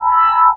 med tone; Dystopia; implants

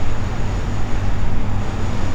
outside; Dystopia; fortress

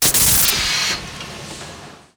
heavy death; Dystopia

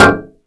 metal impact, Bullet Impact; Dystopia; physics

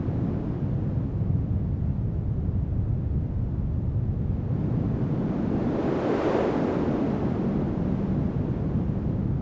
outside windy ambient; Dystopia

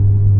machine hum loop; Dystopia; injection

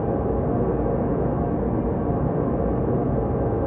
cybernetic alley; Dystopia

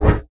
Beam Start; Dystopia; injection

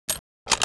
pistol reload; weapons; Dystopia; mpistol

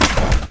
door vac mono; dystopia

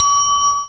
bell

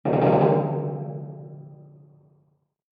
metal creak; Dystopia; injection

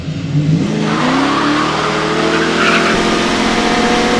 first gear, vehicles; Dystopia; brute

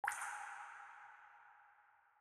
drips, injection; Dystopia

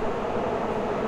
silo ambient; Dystopia

